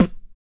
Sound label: PURR PUTT, Vocal Blues Soul; Spectrasonics; Vocal Planet